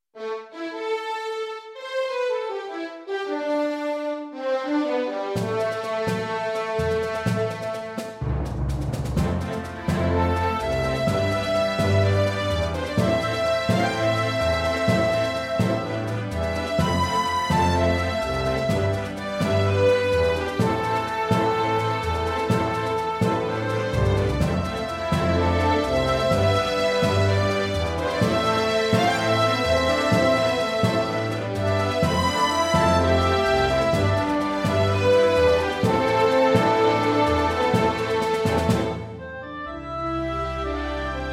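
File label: orchestra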